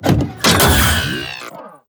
Millennium Death

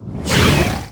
Dragon Turtle Attack

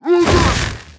Gateguard Attack

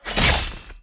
Scorpion Attack; Scorpion King

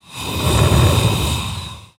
Pyro Attack

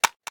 Crab Attack

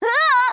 Harpy Death

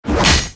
Eternal Wanderer Attack

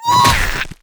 Safety Mole Attack

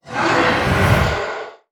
Gargoyle Death

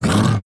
Death; Sunfang

